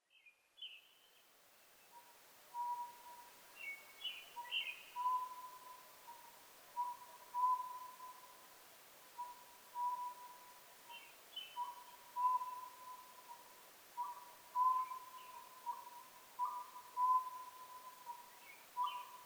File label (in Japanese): konohazuku